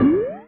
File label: Enemy Boss egg Move